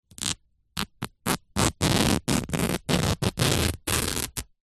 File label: foley fabric towel rip